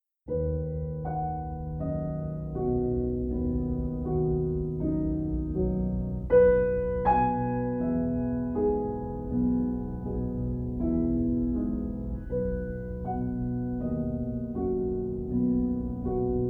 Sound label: Piano Music, Easy Listening Piano